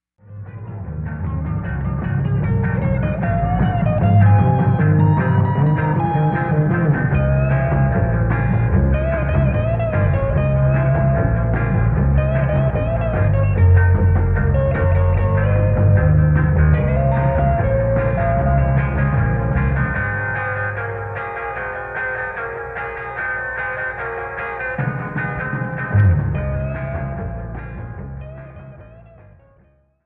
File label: Instrumental